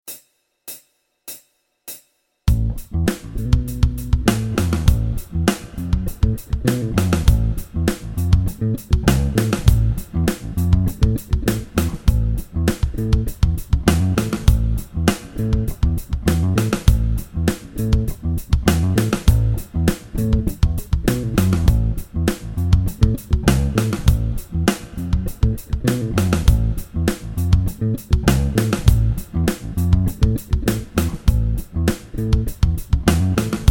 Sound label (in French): playback maj; fusion modes